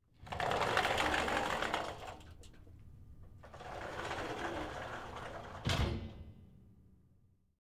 Glass Shower Or Mirror Closet Door Slide Open And Close With Reverb